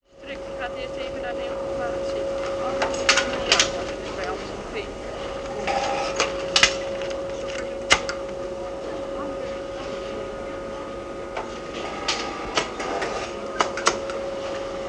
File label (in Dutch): buizenpost